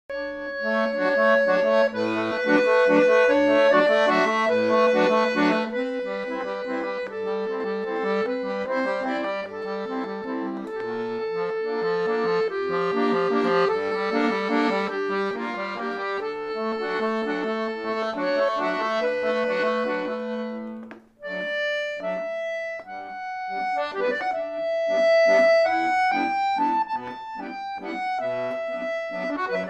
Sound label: Accordion Version